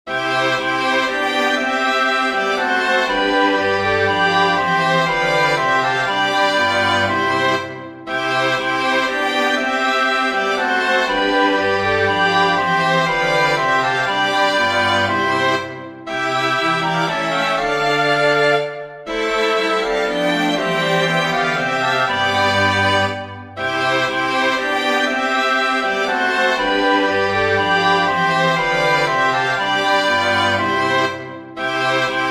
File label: for winds strings; bach johann sebastian; chorale lobe den herren was mir ist lobe den namen